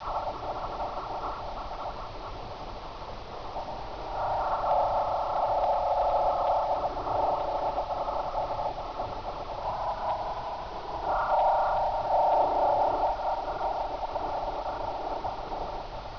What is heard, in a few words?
moan; Quake; PainKeep